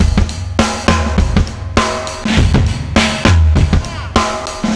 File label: 101 bpm, breaks; Zero-G Beneath the Planet of the Breaks